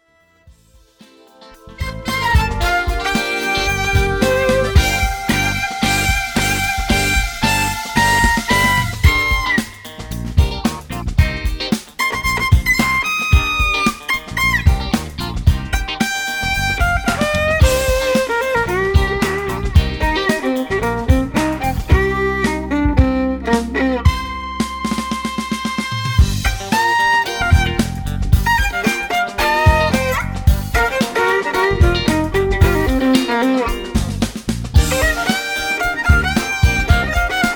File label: funk